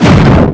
Hit Fire; A player getting hit by Din's Fire (Zelda's fireball)

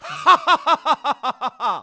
Salvage Corp Leader Laugh; Salvage Corp Leader laughing; Zelda

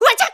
Burn; Link getting burned; zelda

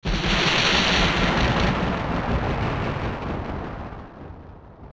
Torpedo; Phantom Ganon; zelda; OOT

